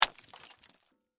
Impact Beer Bottle, Smash; Smashes; Objects; BlastWave

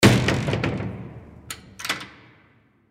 Jail Door Cell, Jail Door Cell Close Lock Reverb; Prison Door; Emergency

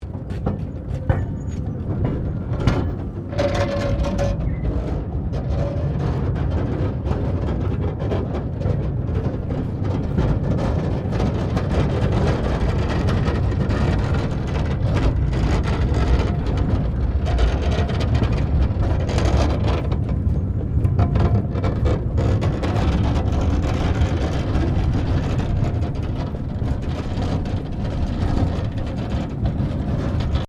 Tram Horse Drawn Carriage Rolling

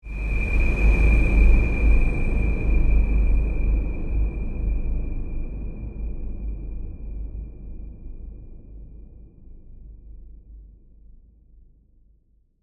Horror Swell Airy, High, Frequency, Eerie; Swell Sound Effects; BlastWave

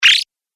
Game App Skid; video game

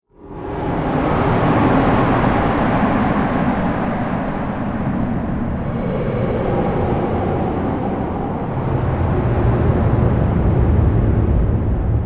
Drone Beneath The World; Drone Sound Effects; sound design

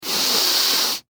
Human Male Sniff Hard; Sick

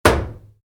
Wood hit metal, Wood, Medium Impact: Metal; Materials; Stuart Duffield

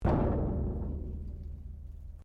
METAL IMPACT QUIET

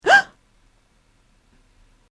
Gasp In Horror British Female; Gasping Sound Effects

